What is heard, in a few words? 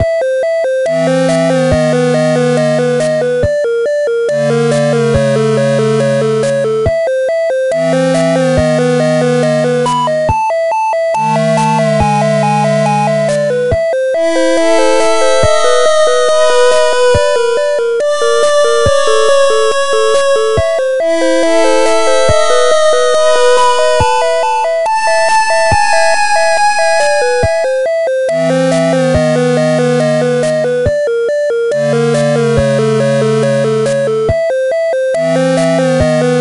Haunted Game Fast